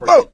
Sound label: ledge hang slip; voice; source engine; left 4 dead 2; survivor